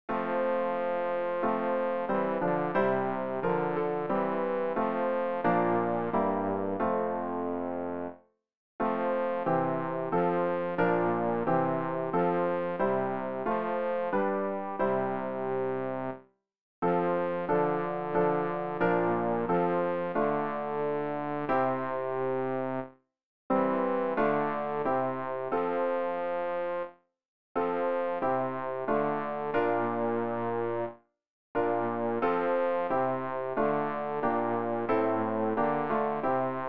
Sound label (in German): bass; Ref. Gesangbuch; RG 613: Mit meinem Gott geh ich zur Ruh